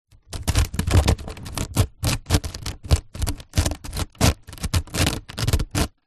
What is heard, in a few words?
foley string movement scrape over wood; zapsplat